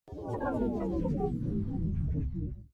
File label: Sfx Reverse Time